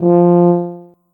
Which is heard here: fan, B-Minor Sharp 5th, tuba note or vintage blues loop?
tuba note